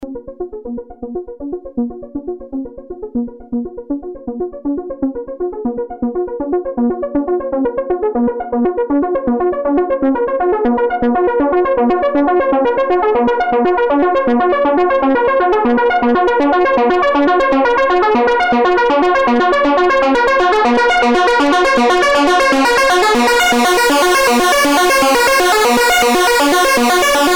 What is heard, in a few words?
PWM Seq